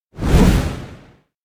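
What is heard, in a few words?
electronic object fly; anemoi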